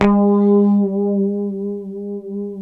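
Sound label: A 3 harmonic, guitar; Spectrasonics; Hans Zimmer Guitars VOL-1; 90's Sample CDs; HYPEMONICS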